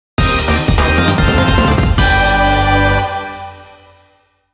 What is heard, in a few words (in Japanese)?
個人戦勝利 ジングル; Gothic wa Mahou Otome Sassato Keiyaku Shinasai; ギルドバトル・個人戦勝利ジングル